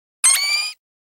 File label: computer bleep; NCH Library